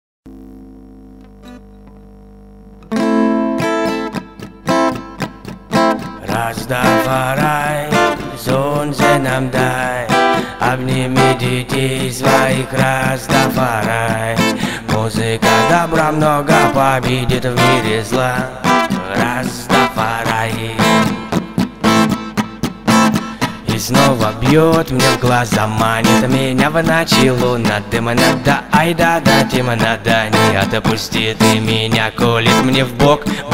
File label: acoustic